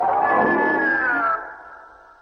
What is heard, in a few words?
roar; mothra